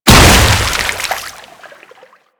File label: Hammer Impact Water A; Warframe; Weapons